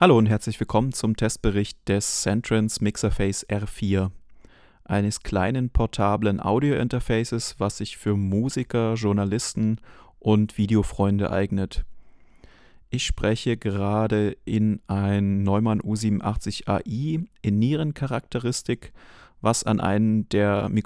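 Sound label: Centrance MixerFace R4 test vocal male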